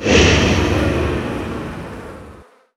decay cast; undying

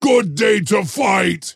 move pain; dota; axe

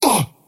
skywrath crown pain; skywrath mage

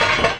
foley, footsteps; rattletrap

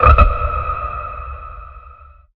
croak cast layer; weapons; dota; largo

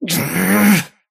attack pain; dota; kez

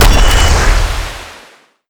crush; weapons; slithereen; dota; slardar

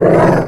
death junglestalkers, creep deaths; dota

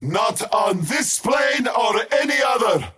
skywrath crown deny pain; skywrath mage

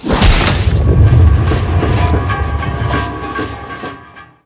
pick, projection; ui; witch doctor; dota

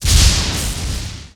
general attack; zuus

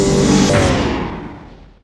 power cogs impact; weapons; dota; rattletrap

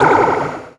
orb absorb; weapons; dota; medusa

